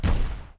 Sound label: giant stomping; 2fast2curious